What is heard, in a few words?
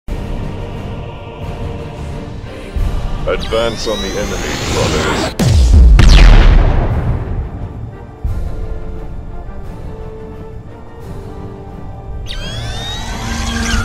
plasma gun; Dawn of War II